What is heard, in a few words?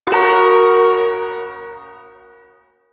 Shawzin Yo Chord F; Warframe; Duviri Ersatz Shawzin